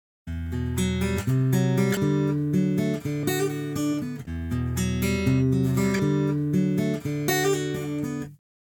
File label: Arp